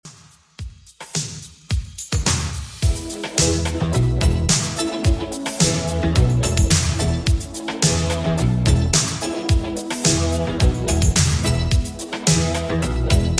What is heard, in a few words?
80s Redrum 108bpm; remixplanet